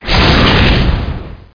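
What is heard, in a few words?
Explosion Small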